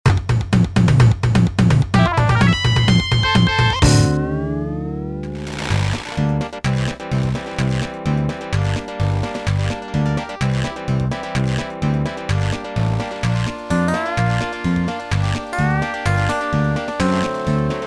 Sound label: Sega Genesis Instrumental Remix; SupremeMIDI